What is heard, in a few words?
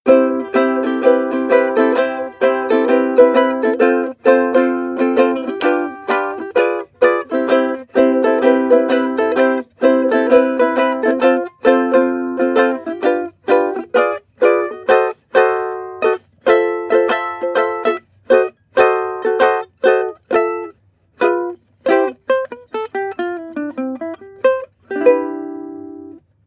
ukulele strumming sequence; loops